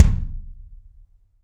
BD AMBIENT, Drums; Real Mega Drums

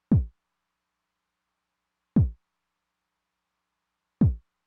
kick drum w accent, Roland TR-909; Roland TR-909 Ableton Project

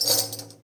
Tamorra, Roland BK-5 Percussion

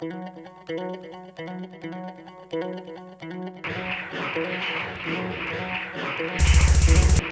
Funk, Hardcore Breakbeat, 131 F#min; Big Fish Audio; Hardcore Breakbeat Action; Funk Factory

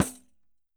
Roland MV8800 percussion; MVPERCUSSION

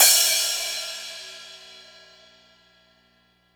Cymbal; Sonic Foundry; Sony Creative Software; Hydroponic Hip-Hop; Beat Components; Hi Hat Cymbal Patterns

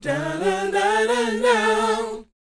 BOYZ, Vocal, R&B Dance

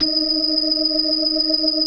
BLOOD OCT, Synth and Organ

